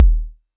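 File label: vermona drm1 mk3 bass drum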